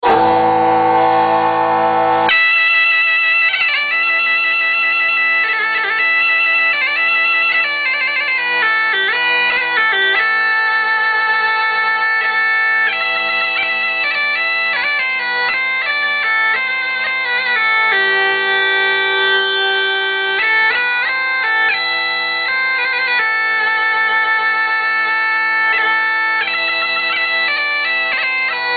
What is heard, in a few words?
bagpipes salmajove